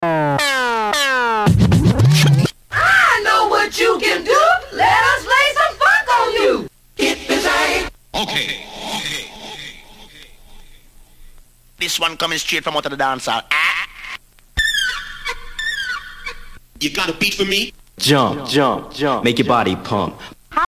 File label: Scratch tools